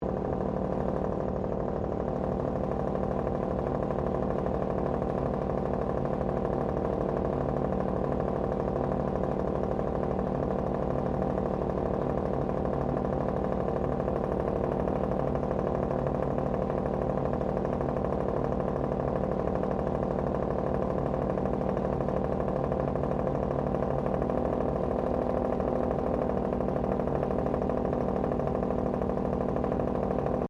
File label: Train Diesel TGR X Class 1951 Tasmanian Idle Frontend Loop; Bellarine Tourist railway vehicles